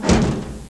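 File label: letter box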